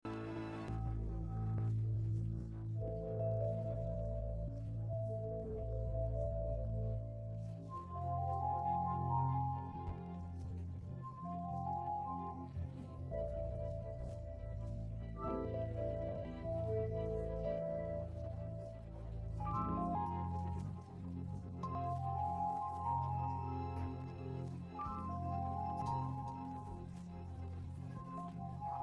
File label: no vocals; 백년의유산(카드하마)